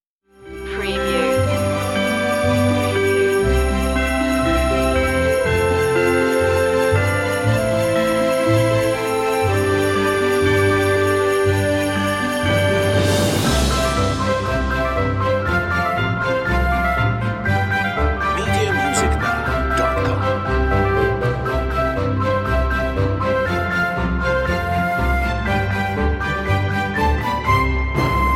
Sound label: no vocal; media music now